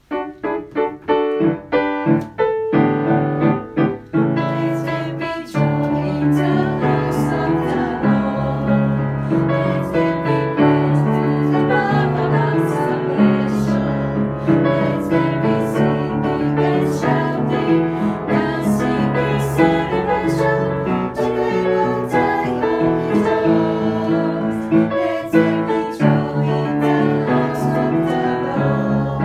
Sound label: Let there be joy Alt